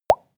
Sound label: pop bubbles; soundpool